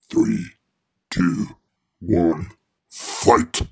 Countdown To Fight

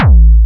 Hardkick

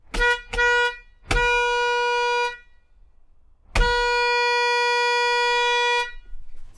School Bus Truck Int Horn Honk

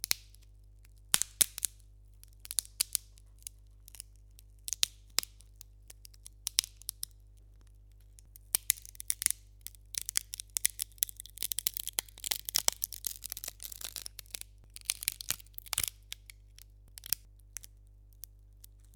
Cracking Twigs Craquements Brindilles

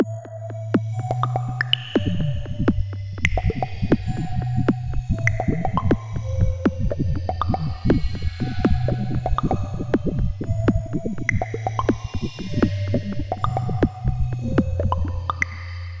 Awkward Dilema

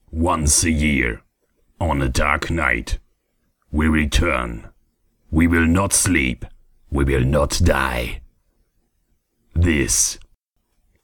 Halloween intro

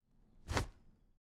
Pulling A Tissue From A Box

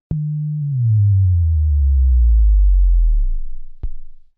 Power Down

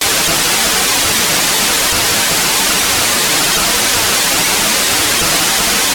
Tv Static

